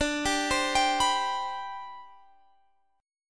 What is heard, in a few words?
D 13th Suspended 4th D; D13sus4 arpeggiated